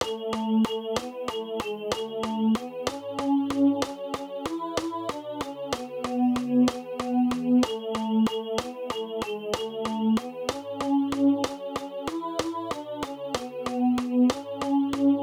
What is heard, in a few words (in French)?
Alléluia Tenor; Messe de l'Emmanuel; Ordinaires de messes